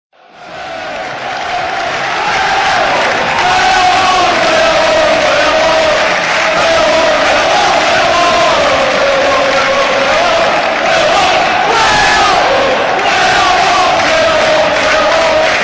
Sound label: Wolverhampton Wanderers o we are wolves, fan chants